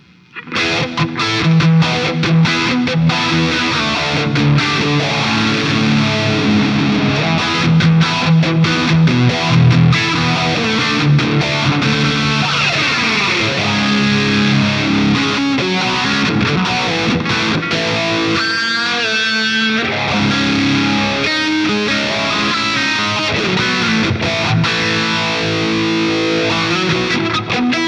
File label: Guild 2016 SFIII Panama; Newark Street Starfire III